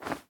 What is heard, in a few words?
Ground short; devour 2021 gamerip pc macos